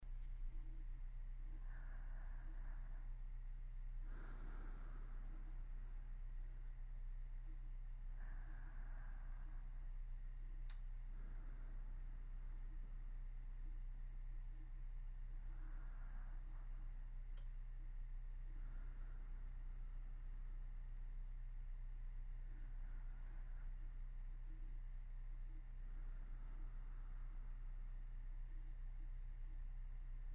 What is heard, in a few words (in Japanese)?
寝息; 本編未使用音源